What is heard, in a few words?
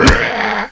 zombie attack; cstrike; zombiebot